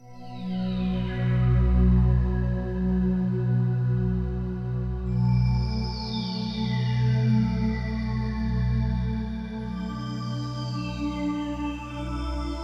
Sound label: Sweepster, Roland D-70; Factory Performances